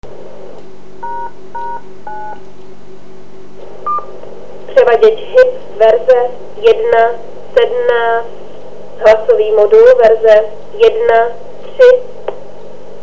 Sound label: air DTMF cmd cross cross; verze HW převaděče a hlasového modulu; OK0BEZ; 70cm RPT